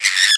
hill wind damage

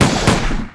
spiral shooting; night watch

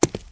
knight of windstorm move

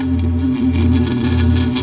shockwave trap; hunter

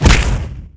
enemy hit fire

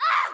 damage swordman female

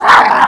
die; zenorc

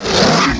gan ceann attack

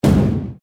knocker die

